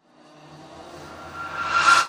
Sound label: Metal Verb Reverse; horror and atmosphere fx; Silent Hill Downpour